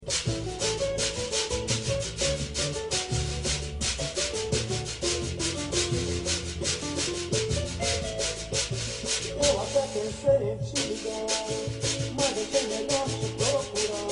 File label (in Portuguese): reco reco; instrumentos